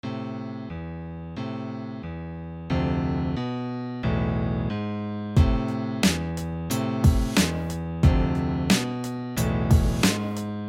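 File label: Rap beat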